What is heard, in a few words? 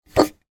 foley can beer empty pick up